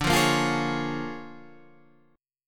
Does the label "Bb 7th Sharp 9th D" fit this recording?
Yes